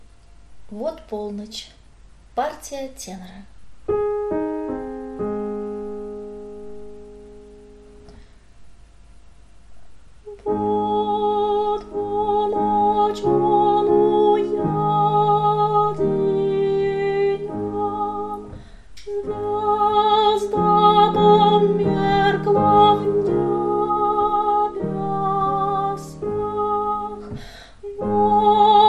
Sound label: tenor; Vot polnoch